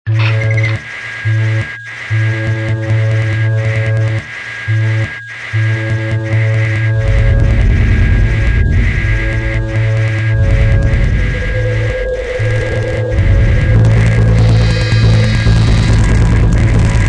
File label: drum machine gun